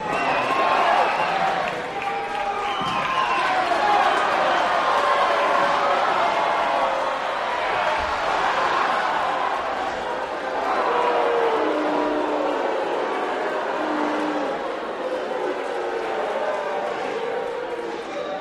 Crowd Reaction Fight, boxing

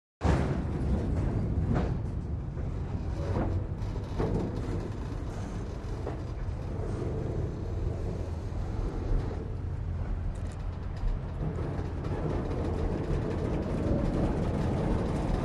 Train Coupling, steam

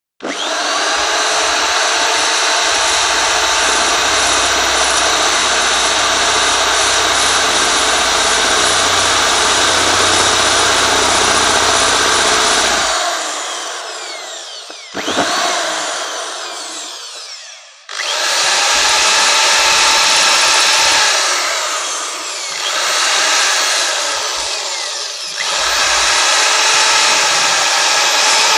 Electric Drill Elect, drills

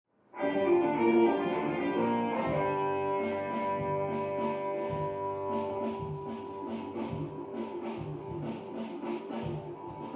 Fusion Funk

